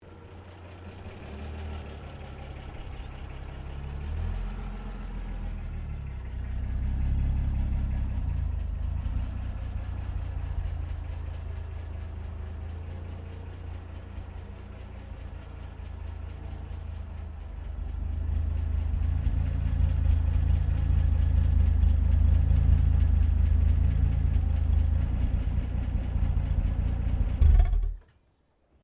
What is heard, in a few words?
CAR HOLDEN FJ PARK SHUTDOWN, Classic Australian cars; Car Holden Fj 1955; Vehicles